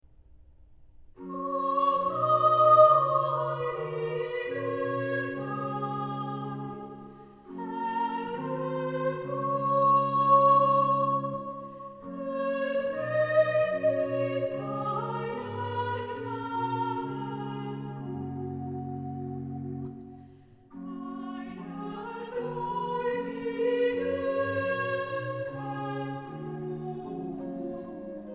Chorale, organ; BWV0059; Komm, heiliger Geist, Herre Gott; BACH; BACH ORGAN WORKS; CHORALE PRELUDES

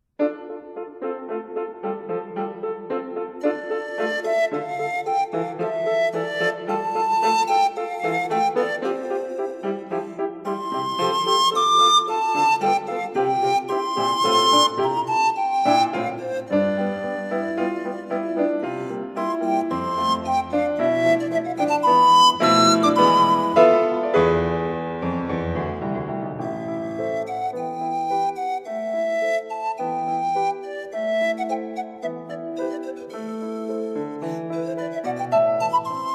Sonatina for flute and piano - Allegro moderato; Pal Jardanyi

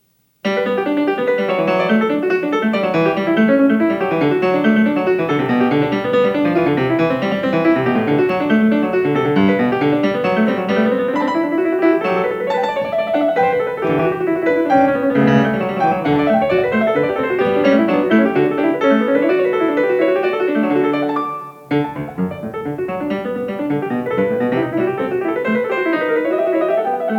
Variation 1 a 1 Clav., PIANISTS; BACH; GOLDBERG VARIATIONS

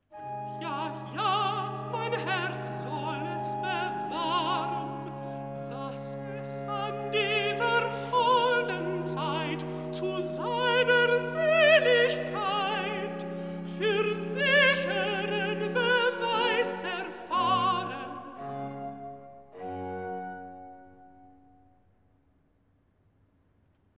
Recitativo (Alto); Ja, ja, mein Herz soll es bewahren; BACH; Christmas Oratorio

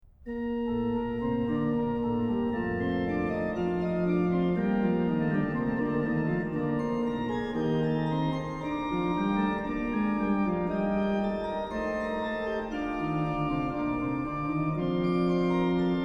ORGAN, Chorale Preludes; BWV1117 Alle Menschen mьssen sterben; BACH; BACH ORGAN WORKS

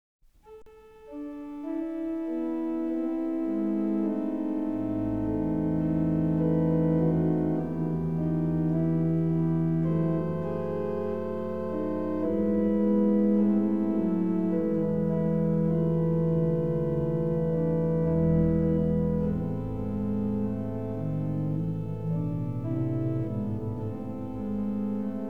ORGAN; Nun komm, der Heiden Heiland; Bach; Bach Organ Works; Choral Preludes

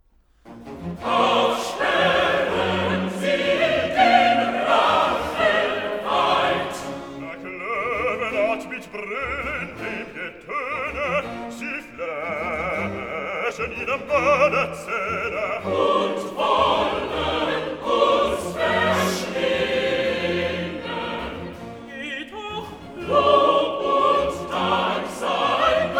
Bass Tenor Alt; vocal; alto; BWV178; Auf sperren sie den Rachen weit; BACH

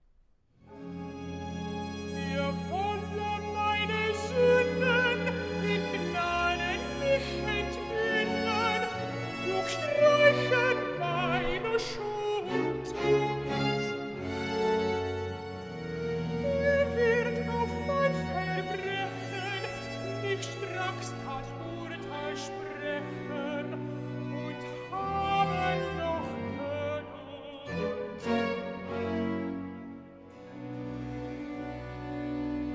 Recitativo Alt, BACH for ALTO; vocal; BWV097; Versus V; Er wolle meiner Sunden

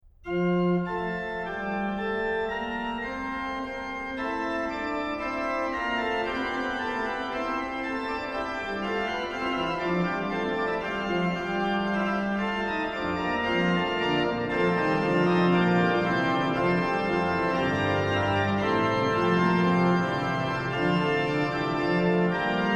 Christus, der ist mein Leben, organ; BACH; BACH ORGAN WORKS; Chorale Preludes

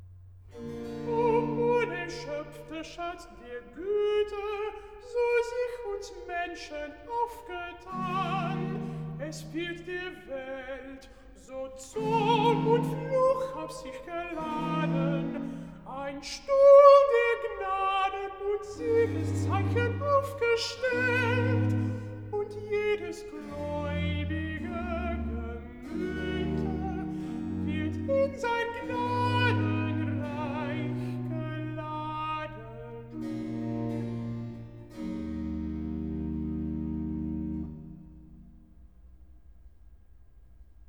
Recitative & Chorale (bass); O Wunder, dass ein Herz; Bach; cantatas; Mit Fried und Freud ich fahr dahin

